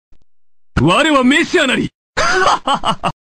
hahahaha

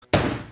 slams desk; nogla

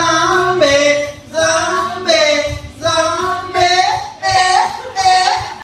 zombie

